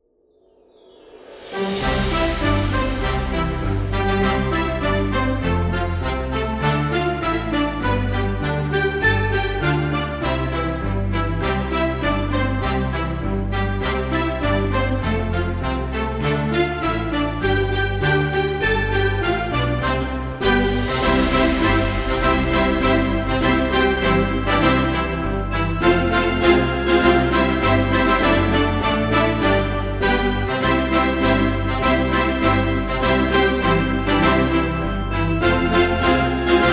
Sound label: Christmas Bells, cheerful themes